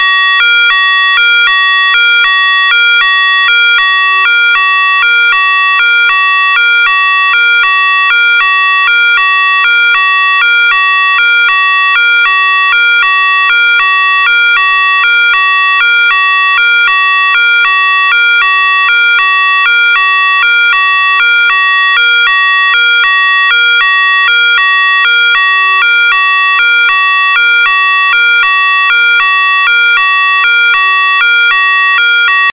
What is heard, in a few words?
special warning